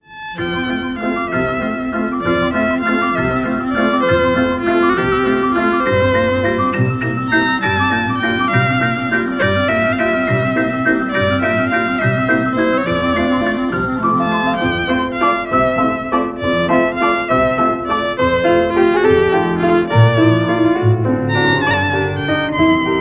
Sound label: Poets Solo